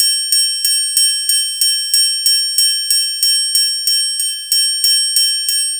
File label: MPC4 HH6-R, Underground Hip Hop; USB Soundscan vol.02; AKAI